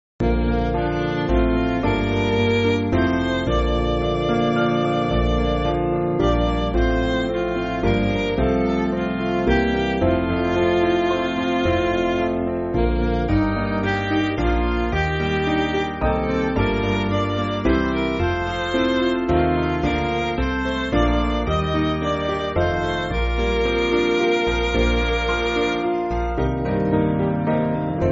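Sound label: Midi, Music Backing Files for Churches; I Bless The Christ; Shere; 6/Bb Chord Sheet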